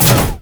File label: rumble shield